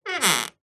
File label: household door wooden creak short